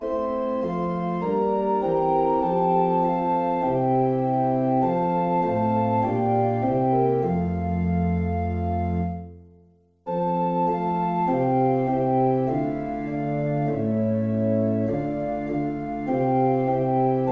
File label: Hymn organ; Glory be to Jesus; Caswall